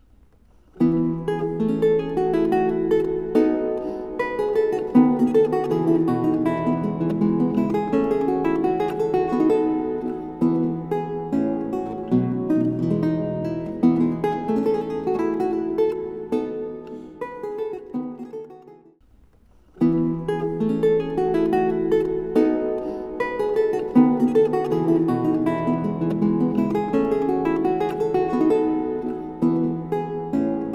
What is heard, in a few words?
crosstalk m39dB